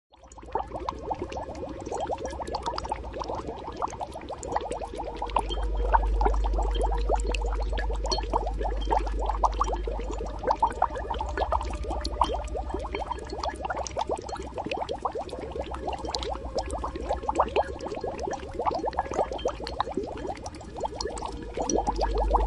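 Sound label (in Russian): звук волшебного кипения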